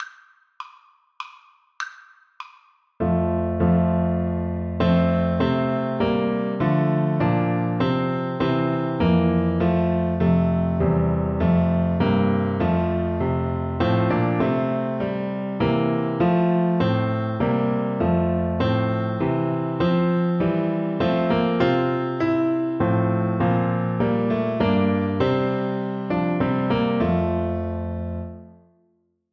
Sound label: Tuba